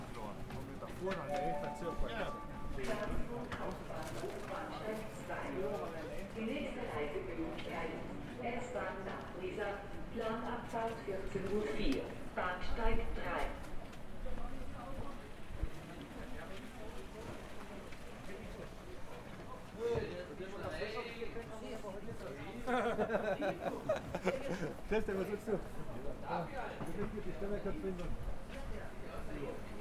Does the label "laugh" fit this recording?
No